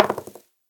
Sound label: dice Coll Last; jumanji